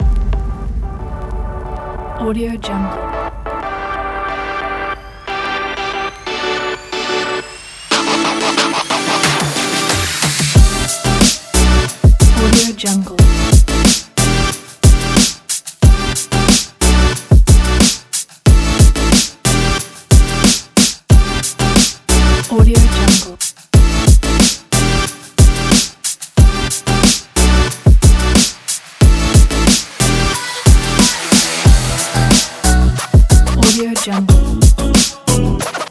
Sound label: background hip hop beat